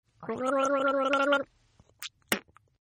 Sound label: Gargle Spit; Gargling; Voice; Partners In Rhyme